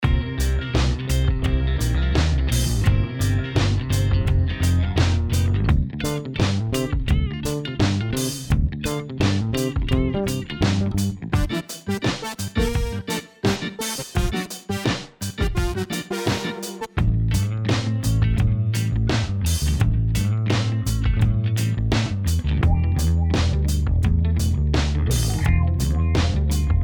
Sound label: 85 rock; background music